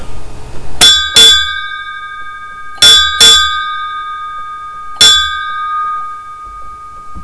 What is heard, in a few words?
navy bell